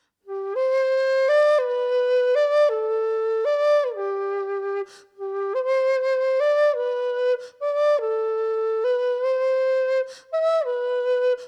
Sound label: whistle G